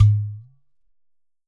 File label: GHATAM